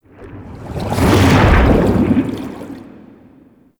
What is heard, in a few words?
Anchor Cast; Orbital Station